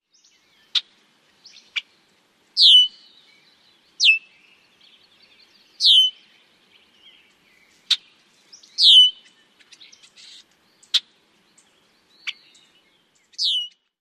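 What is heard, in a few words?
male chack calls and burry whistles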